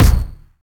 treble rich kick drum d key, kick sample tuned to the D note